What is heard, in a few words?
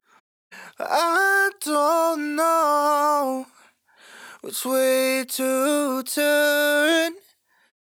Sung Phrase Dry, 128 BPM A Min, vocal; I Don't Know; Cymatics; Euphoria Vocal Sample Pack